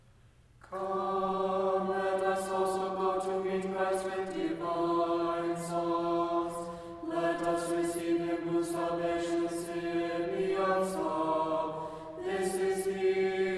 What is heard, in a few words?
Tone1 Common Sticheron Example Unison